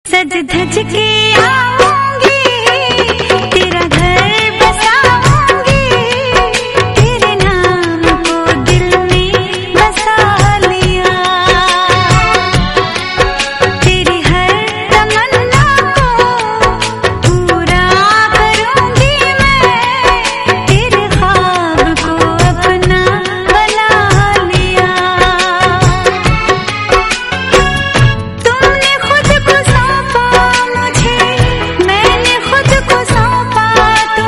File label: romantic